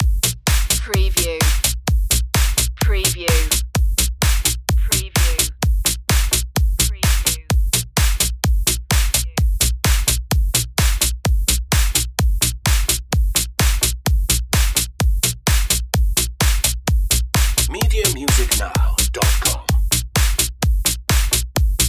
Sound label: club dutch loop